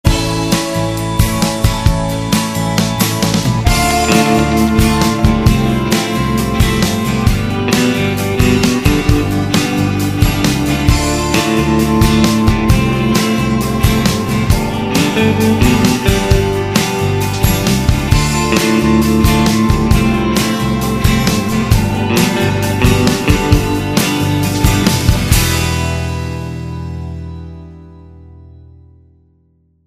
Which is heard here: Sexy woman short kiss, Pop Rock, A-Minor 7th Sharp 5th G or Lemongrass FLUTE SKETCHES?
Pop Rock